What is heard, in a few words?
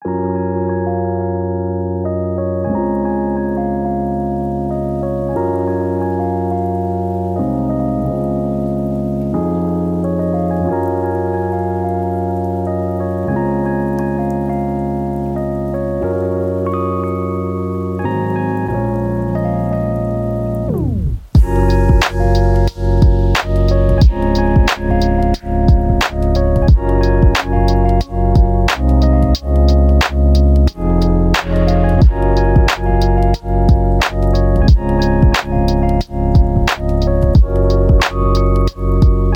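Gentle Rain, Chill Beats, lofi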